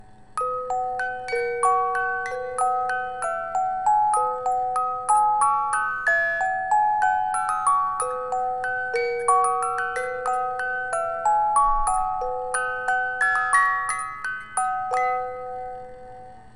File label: Music Box